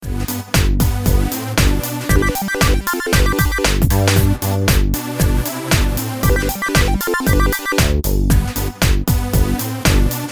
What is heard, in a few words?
pinball spring, 8 bit video game ringtones; Android game music